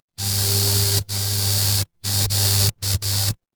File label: fire electric hum